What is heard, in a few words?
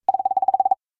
cartoon rattle